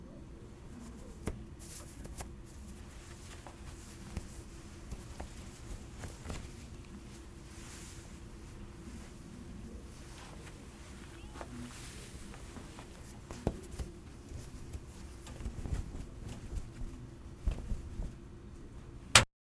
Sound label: Clothing Shuffle